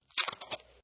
Tape Small Roll Pull Off Tape, Pull tape off a small tape roll and tear off end.; Offices